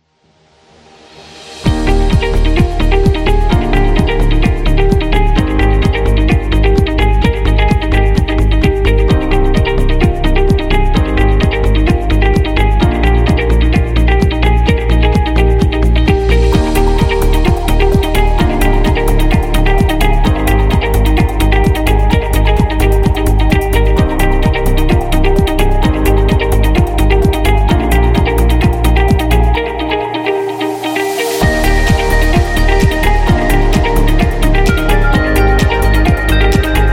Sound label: Background Upbeat